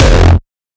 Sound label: kick oneshot monster D#; GhostHack